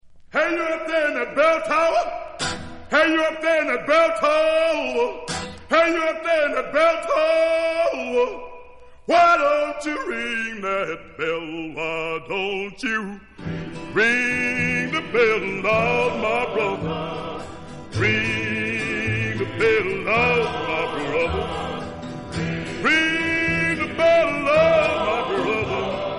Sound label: Ring That Bell